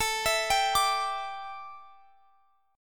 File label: A 7th Suspended 4th Guitar Standard, chord; x,x,19,21,20,22; arpeggiated; capo=9